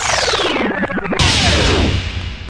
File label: Electro FX; Ueb HE Electro FX